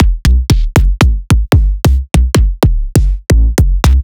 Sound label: Hard Kicks; Antidote